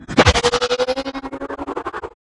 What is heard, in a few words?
Sfx Baseball